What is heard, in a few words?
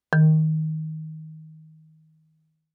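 Marimba yarn fortissimo Eb3; MIS Pitches - 2014; Percussion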